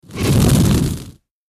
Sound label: Fire Breath Dragon